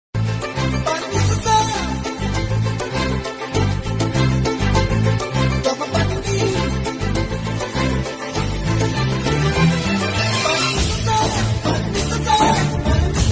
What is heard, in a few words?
bgm; bommarillu